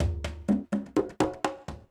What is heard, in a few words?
PERCUSSN ETHNO 125, Drum